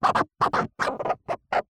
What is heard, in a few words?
contact mic,homemade,Vinyl Record,scratch,arch,stutter,zigzag,multiples; Outward Inversion